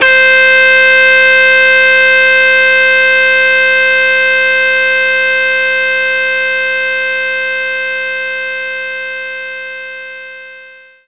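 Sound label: electric guitar C4; knights try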